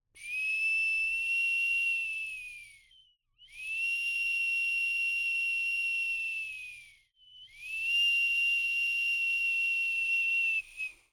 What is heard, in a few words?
Bird whistle, clay, painted, water, airy, wispy, sustains, long, soft cries, 3 notes; Eiravaein Works; Vaeyan IV